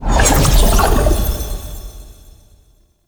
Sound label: Portal Enter; Ambience; Gameplay; Fibonacci; Warframe; Entrati